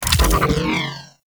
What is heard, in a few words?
Mine Impact; Warframe; Venus; Venus Commander Orange; Abilities